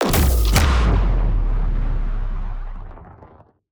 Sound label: Large Sci-Fi Explosion